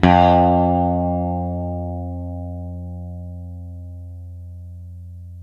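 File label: SITAR F 1 left, coral sitar, guitar; East West; Steve Stevens Guitar Samples Collection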